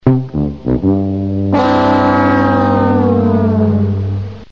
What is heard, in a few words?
price is right fail